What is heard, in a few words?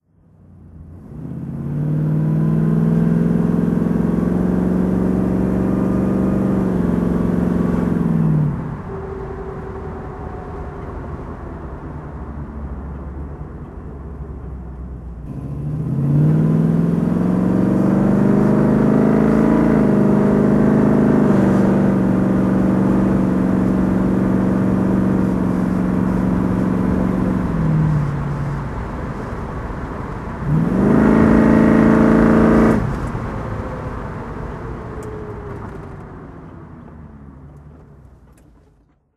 ford mustang 347 driving